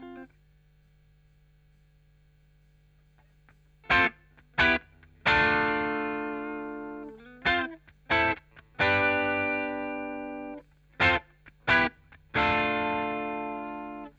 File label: Chorus Gtr